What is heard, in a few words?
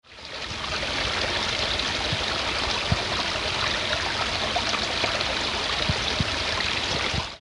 stream in Myrtle beech forest South Victoria Australia